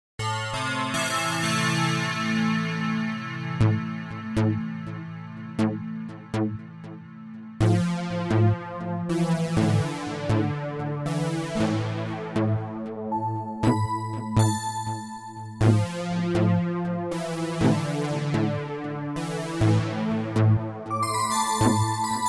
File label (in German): Klangimprovisation